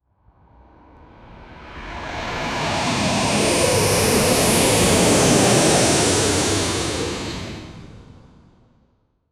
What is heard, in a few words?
jet air crash passing by